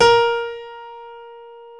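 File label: piano A#3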